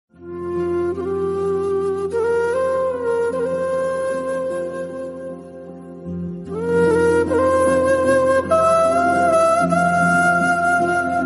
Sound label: flute indian army ringtone